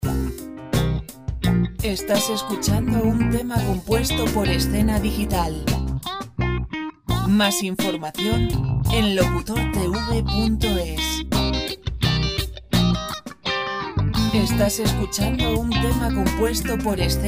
85 pop

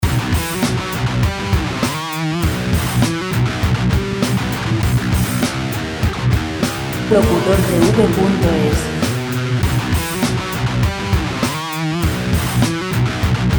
100 rock; Música rock sin derechos

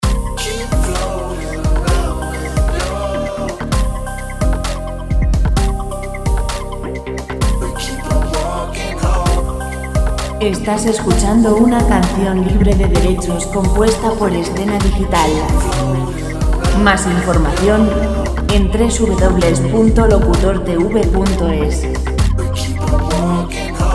130 vocal; vocal musica libre

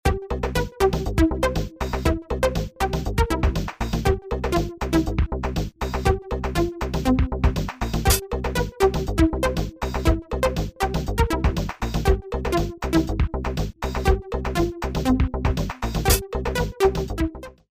musica 120 pop

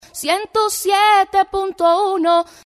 jingle dial 1071 version 2, Dial 107. 1 Cantado

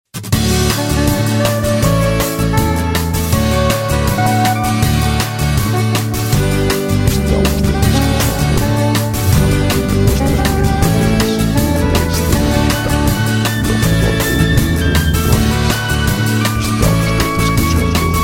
canencia folk 160; Música de fondo para vídeos

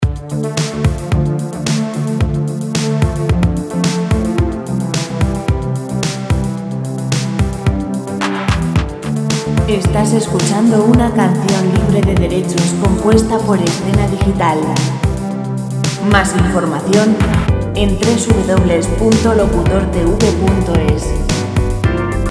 sintonias pop; Música pop

